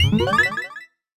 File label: Warp Porter Start; SM3DL